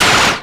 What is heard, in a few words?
Percussive, Drums Perc One Shots